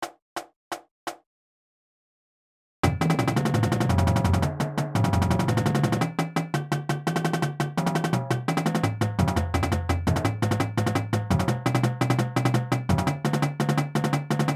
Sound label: Roll, Drumline Warmups